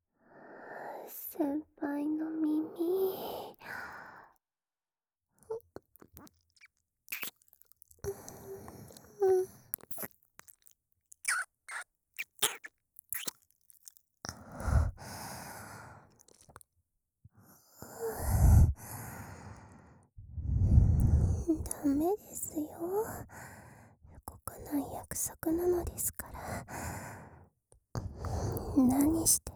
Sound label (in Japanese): 耳舐め 告白, ASMR